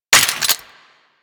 shotgun low ammo mech; Weapons; Warframe; Tenno; Alchemist

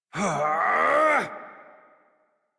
Exert Adult, Melee; Warframe; Duviri; Drifter; en

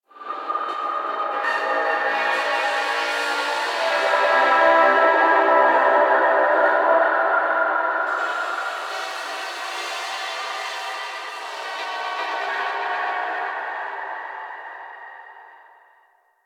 Energy Thread Music; Warframe; Apostasy

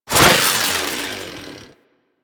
Hand Saw Whoosh

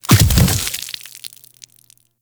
Brain Cyst Explosion; Warframe; Infested Eris

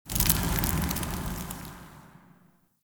Soft Flame Burst; Ambience; One Offs; Champion Crypt

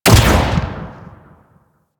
Spear Shot Hit, Weapons; Warframe; Tenno; Priest Spear

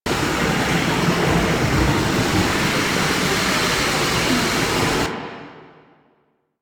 Dissolve Door Loop; Warframe; Ambience; Entrati

